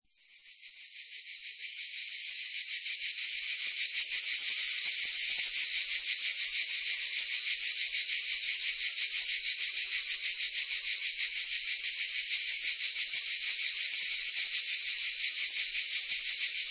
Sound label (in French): cigales